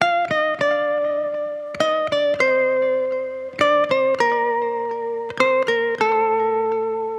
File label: Guitar Loop Wet - 100 BPM G Min; Melodics; Instrument Loops; Guitars; Processed; Cymatics; Cobra Hip Hop Sample Pack